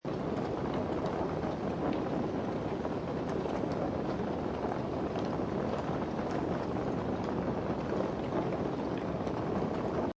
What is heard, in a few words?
Torch Flame Burn Constant Flicker Loop, Constant crackling and flicking sound of a burning torch; Constant crackling and flicking sound of a burning torch or small fire, seamlessly looping sound.